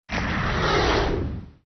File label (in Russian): Shuk rychachiy